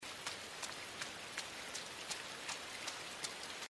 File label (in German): laufen in pfuetze; inside